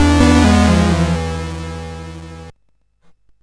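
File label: Nebulus, MOS 8580 SID chip music, games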